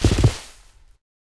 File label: Horse Run Forest; Civ4